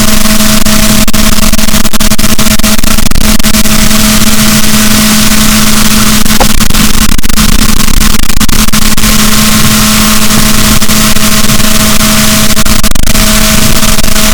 rorquals negatif, boat

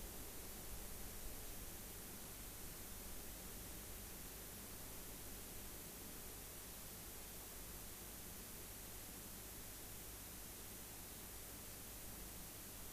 rayol farm; SEAMED

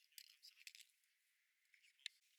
DRONES

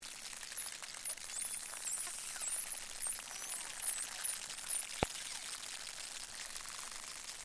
Frasers, Voices in the sea

